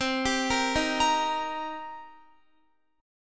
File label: C Minor 7th C Guitar; Cm7 arpeggiated; x,15,17,15,15,17